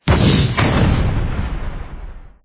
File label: UI man kick, football; Brazil